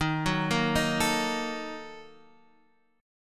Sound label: A sharp Major 7th Suspended 4th Sharp 5th, guitar